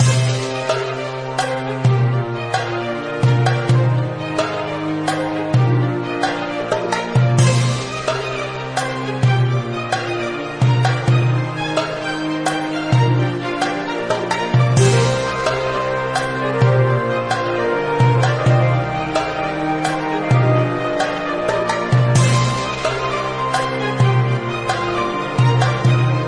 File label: bgm; squidGame